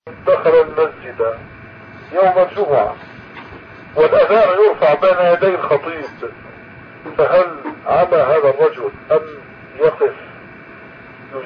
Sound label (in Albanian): ezani; Një burrë hyn në xhami ditën e xhuma ndërsa po thirret ezani